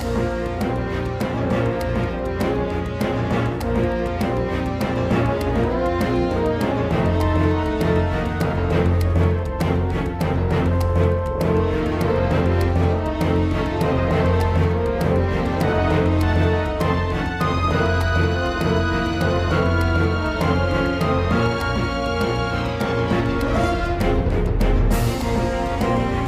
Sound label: mine cart